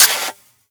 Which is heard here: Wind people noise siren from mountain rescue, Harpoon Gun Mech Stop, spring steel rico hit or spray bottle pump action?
Harpoon Gun Mech Stop